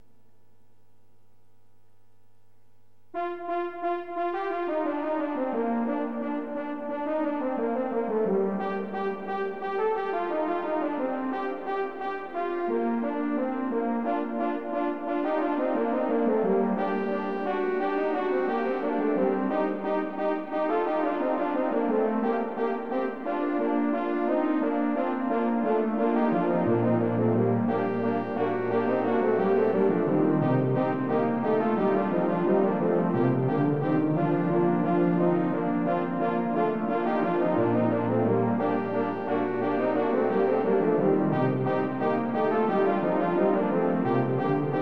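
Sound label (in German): kanon für 3 hörner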